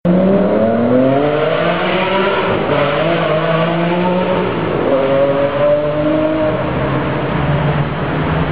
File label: Ferrari Enzo Sound